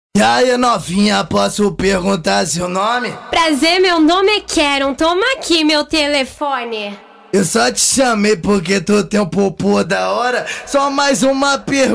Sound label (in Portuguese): musica mega funk